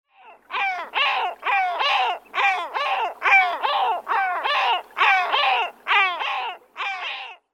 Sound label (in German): Mantelmoewe Larus marinus